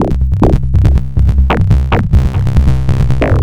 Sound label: acid 140 loop, synth loops